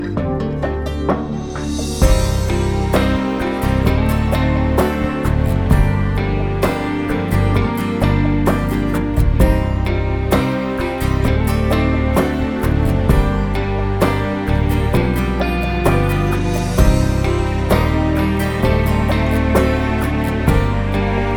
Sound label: Instrumental